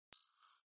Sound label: user interface zoom in